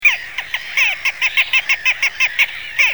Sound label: Larus minutus